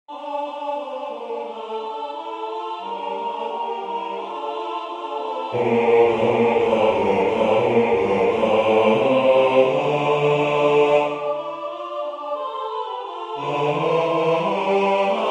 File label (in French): basse; O Dieu de clemence